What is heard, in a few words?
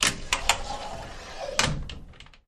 Door Bi Fold Close